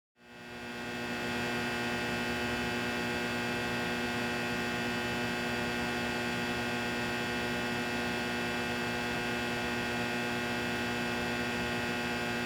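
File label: transformer substation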